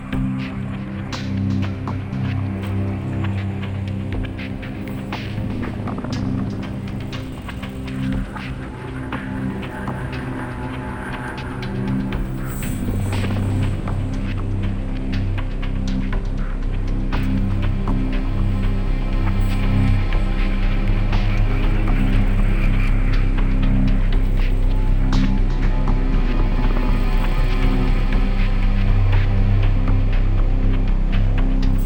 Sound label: Ambiant